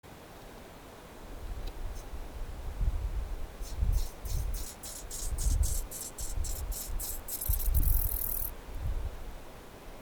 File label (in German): Chorthippus pullus vor dem aufspringen auf das Weibchen; WSchweighofer